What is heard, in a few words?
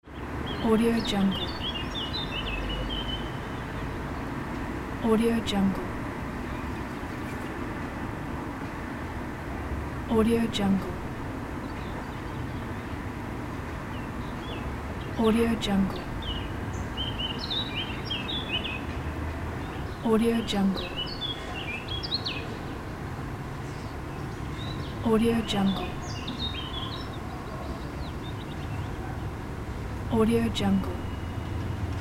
park